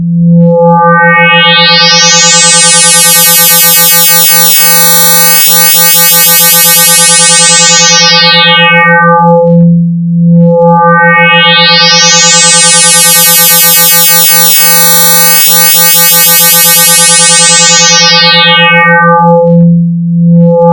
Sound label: sine